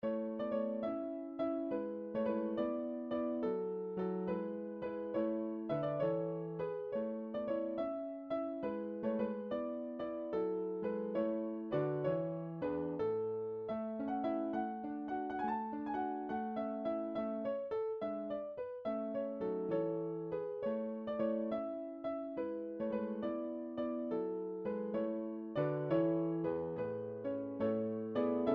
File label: piano sonata